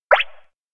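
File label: electronic press headtop muni; anemoi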